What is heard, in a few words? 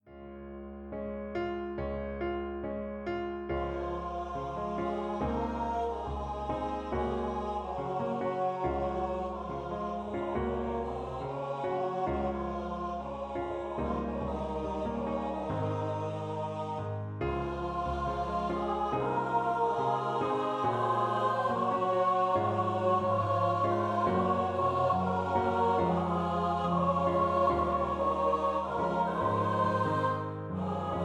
3 part song for Mixed Voice Choir